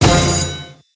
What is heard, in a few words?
stop bonus; Flying Dutchman